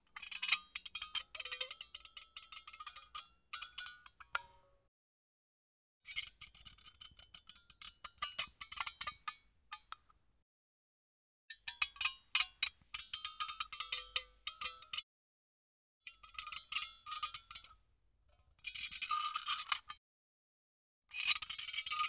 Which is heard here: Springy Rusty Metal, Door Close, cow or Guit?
Springy Rusty Metal